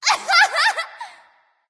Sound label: nv yay; smite; awilix